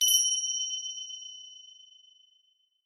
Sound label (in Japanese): tirin